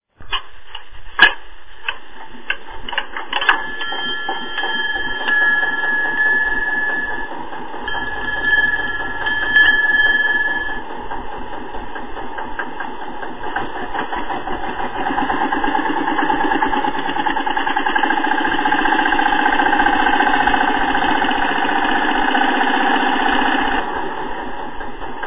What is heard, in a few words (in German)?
Feldbahn